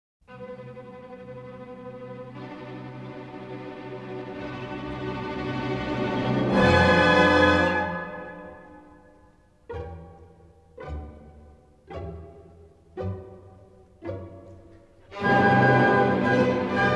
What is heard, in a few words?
Violin Concerto in B minor, Allegro maestoso; Paganini